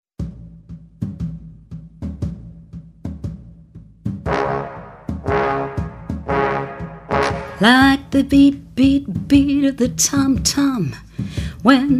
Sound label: singing